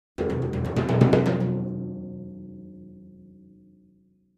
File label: Timpani Hands Thin, mallets timpani